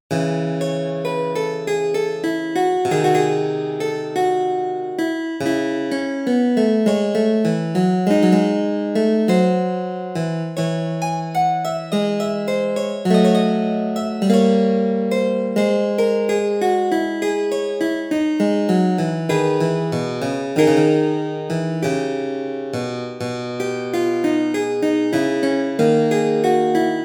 Dutch Harpsichord U8; Praeludium; JS Bach

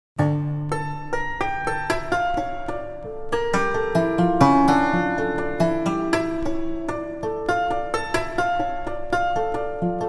lutheal piano cimbalom praeludium; Haendel; edition mim

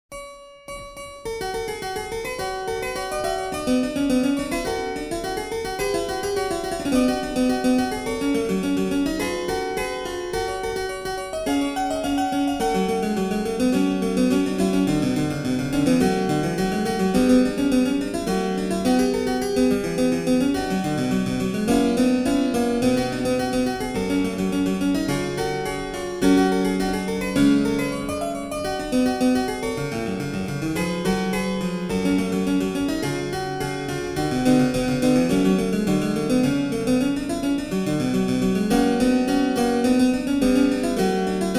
Italian Harpsichord II; Giovanni Gabrieli; Ricercar

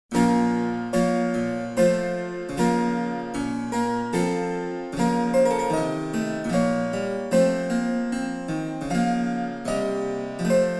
German Harpsichord, Rear 8; German Harpsichord 1741; Rear 8 Demo; edition organeum

